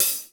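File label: dry open hi-hat, dry kits; Crypton; DrumBase5000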